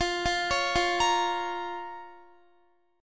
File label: F Suspended 4th Sharp 5th, down, Guitar; arpeggiated; 4F,4A,5C,5G,5C,6E; JGuitar